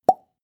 plop; koerpergeraeusche; menschen; soundpool

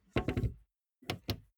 car bmw e46 exterior gas cap close

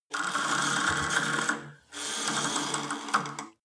cable machine old pull release, fitnessstudio; sport freizeit

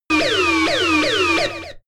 synthetic horn; alarm; soundpool

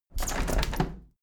window handle plastic squeak open tilt heavy; objekte; fenster

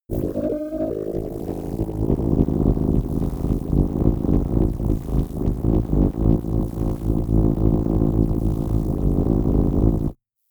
drone synth bass sizzle; musikelemente

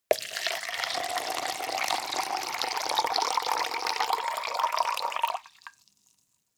cup plastic pour hard

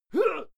male fight attack hit; soundpool

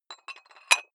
dumbbell put on rattling big weight; sport freizeit; fitnessstudio

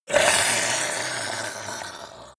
yeti hiss slimy long; kreaturen

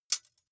midsize metal thin hit soft

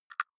click hard low, signale

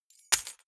key ring large drop parquet; objekte; schluessel

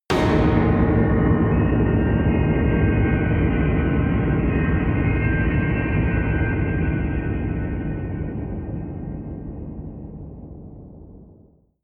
horror windbells, drones; musikelemente

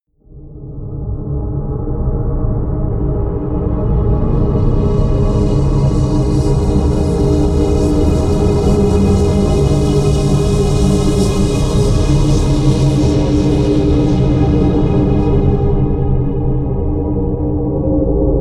drone moving air low

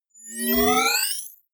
screen turn on whoosh; energie

